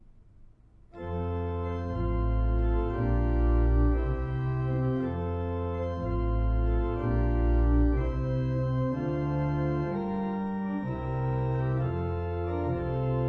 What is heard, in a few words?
air water music, organ; handel